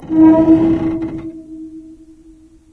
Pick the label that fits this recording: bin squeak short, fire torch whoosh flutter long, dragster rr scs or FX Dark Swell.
bin squeak short